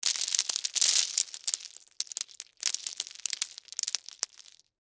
Debris Lima Beans; Texture; Debris Sound Effects; Materials

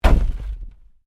Wreck Panel Impact Fist, Wrecked Cars; impacts, crashes, scrapes; Objects

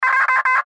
Radio Morse Code; Weak Signal Letter J; Warfare; Blastwave

